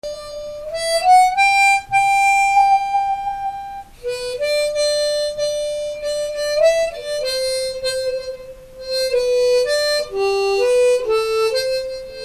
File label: electroharp